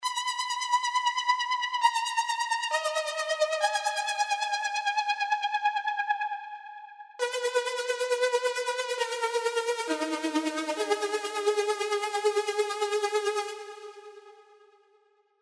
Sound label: Vibra Chord; SERUM